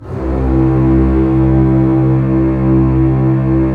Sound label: STR, Cbs Arco, Orchest; Roland L-CD702; Cbs2 Orchest